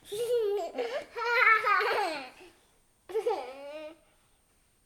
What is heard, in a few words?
Child Laugh